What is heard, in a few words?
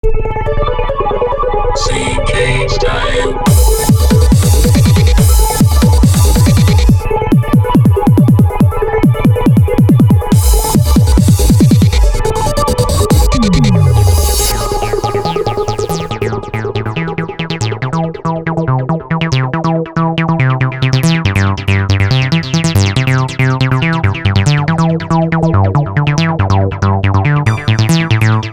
Electro Style